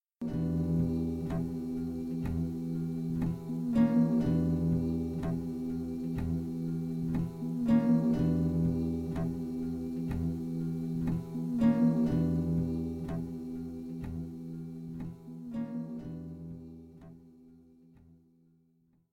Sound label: loop